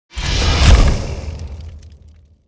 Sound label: Fire magic spell short attack; fantasy